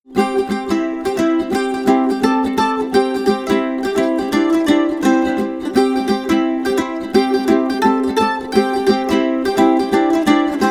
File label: easter jam